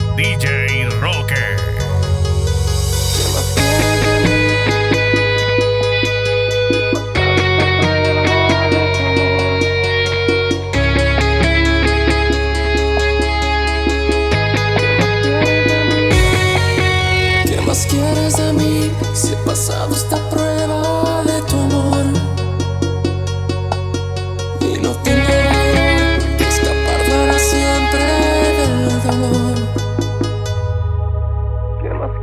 Intro Guitarra

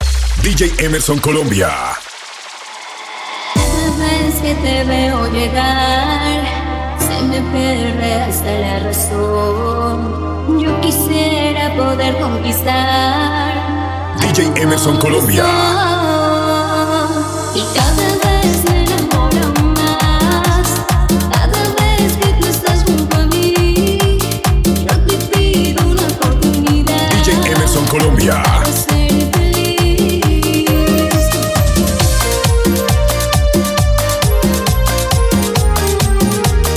Entrada Rumba Kids

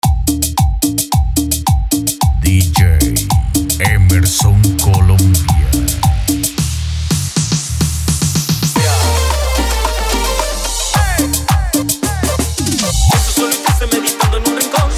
Mix Cumbia

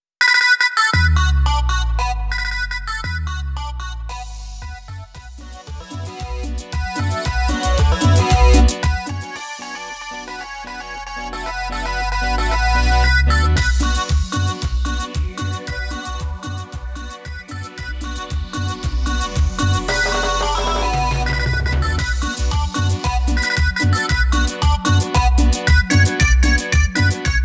Open Show Cumbia Kalle

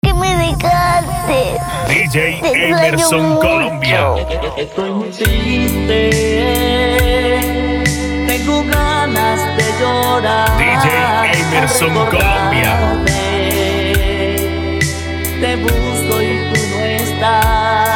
Entrada Rumba Kids

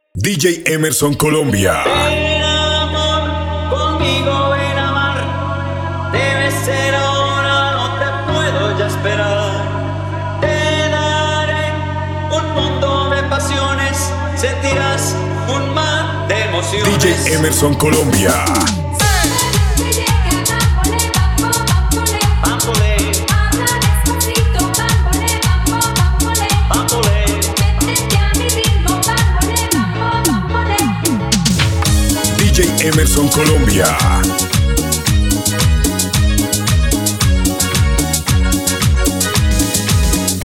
Entrada Afrosound

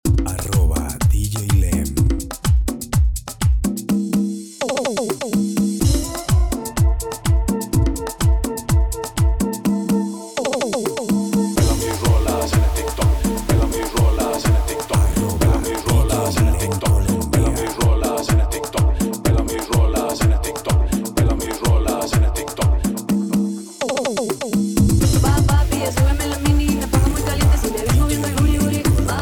merengue

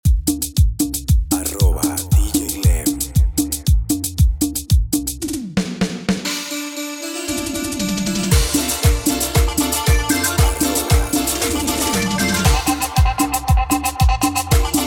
Solo Kumbia Calle

